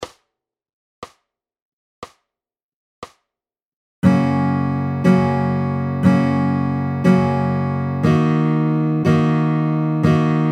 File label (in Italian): Giro di Fa maggiore Fmaj